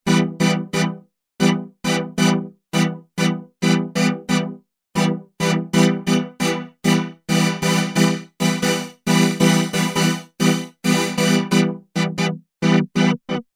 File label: Chords; Xerxes